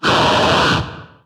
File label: chase growl; fallen